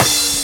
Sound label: CRASH; USB Soundscan vol.27; FILLACOUS